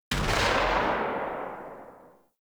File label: SMG Tail, Weapons; Warframe; Tenno; Protea